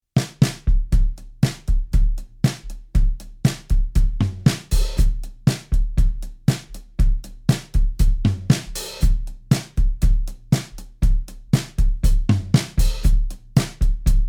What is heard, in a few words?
DRUM; Weird Old Man; dry drum sound